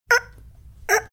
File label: making choking sounds